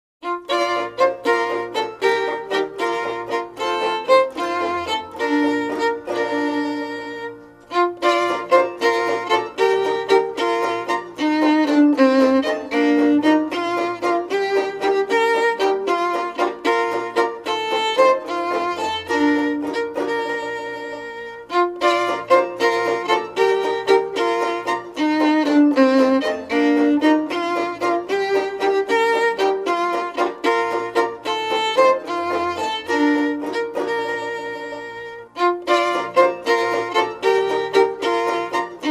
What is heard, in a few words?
the handcart song music only, childrens songbook portuguese